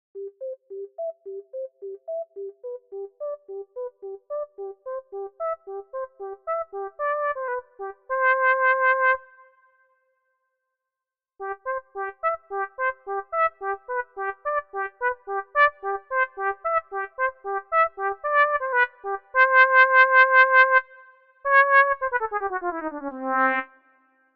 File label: Trumpet